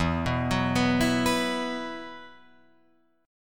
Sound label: E Suspended 2nd, Guitar Open F; Esus2 arpeggiated; 4,6,6,6,7,6